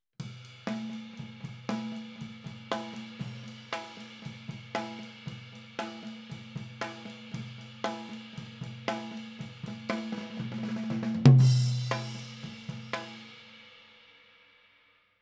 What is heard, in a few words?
Toms